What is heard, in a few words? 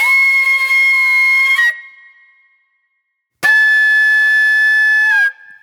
Wild Flute; Sonic Foundry (Sony Creative Software); Manhattan Flutes